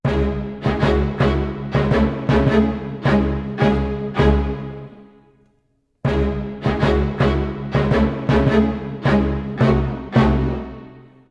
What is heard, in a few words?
80 c# min full mix, orchestral arrangements